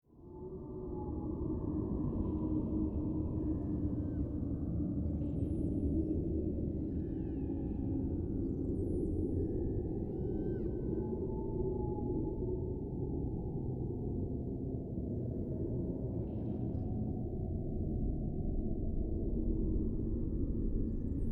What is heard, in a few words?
ambient